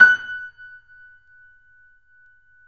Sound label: F#, 3-D Audio Collection, 3D Pianos, Yamaha C7; E-MU Producer Series Vol. 5